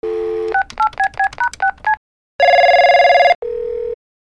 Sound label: PHONES